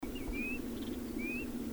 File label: Black Shouldered Kyte Call